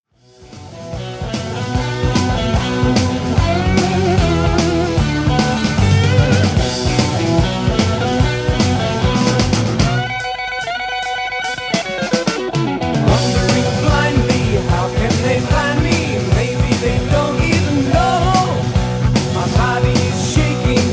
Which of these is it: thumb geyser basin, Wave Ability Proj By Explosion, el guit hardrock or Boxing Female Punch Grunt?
el guit hardrock